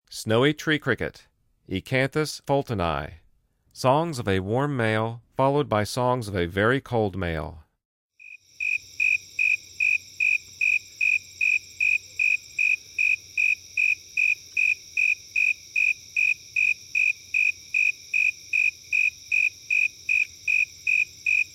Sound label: Snowy Tree Cricket, Insects; The Songs of Insects